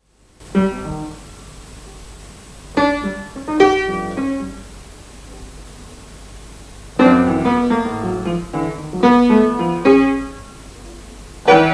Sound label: Piano